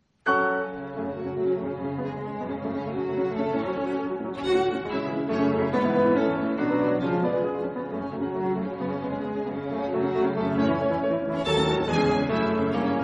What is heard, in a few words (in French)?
Quintette